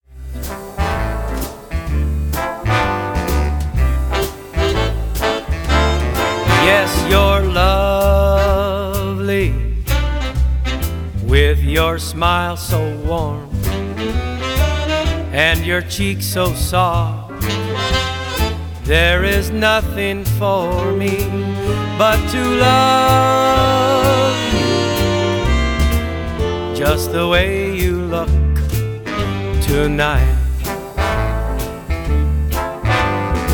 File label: male vocal, big band